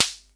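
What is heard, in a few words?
SR95 snare drum; Best Service; Dance Mega Drums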